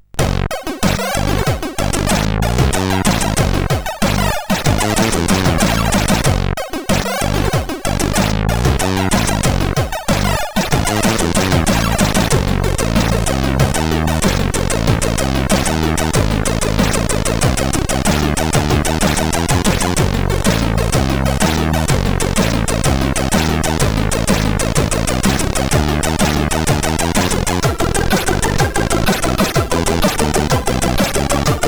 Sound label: sid CSG8580R5